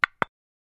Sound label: Button Click Off